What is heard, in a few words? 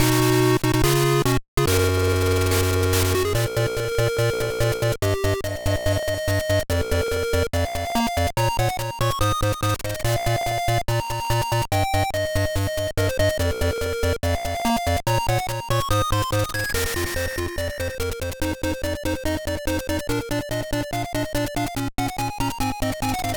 Victory Fanfare; Lufia Ruins of Lore; gba